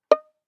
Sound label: Violin pizz ff sul G D5; Strings